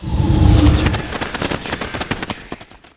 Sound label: carrion swarm launch; warcraft3